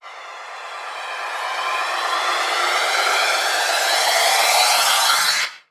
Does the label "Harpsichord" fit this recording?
No